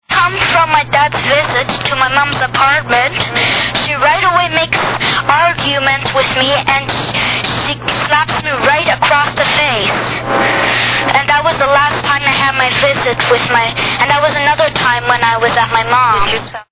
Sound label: slap